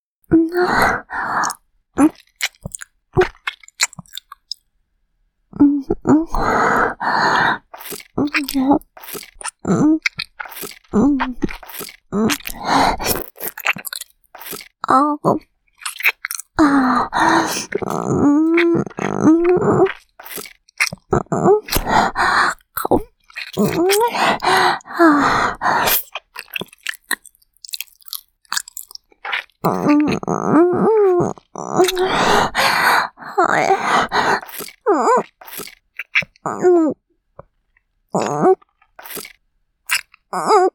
感じるキス, ASMR

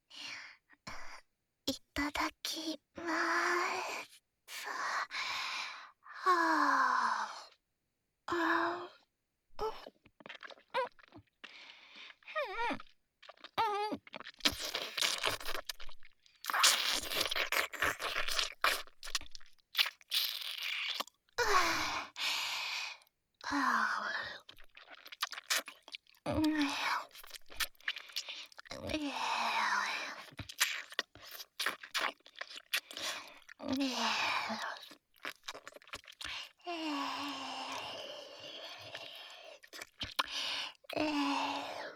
フェラ(口交)

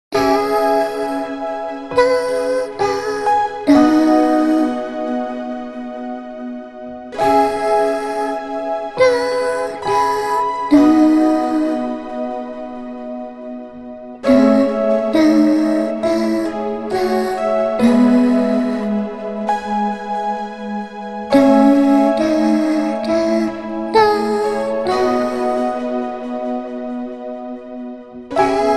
子守唄 アレンジバージョン

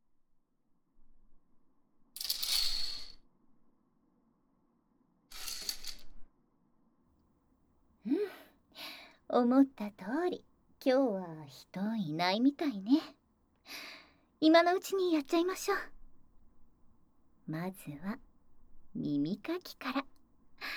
耳かき（左耳）; お嬢の耳かき（左耳）

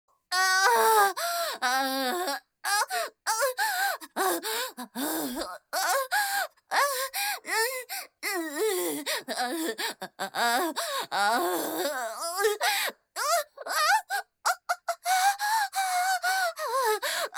絶頂（SEなし）高潮

